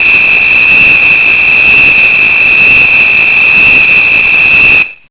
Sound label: whistle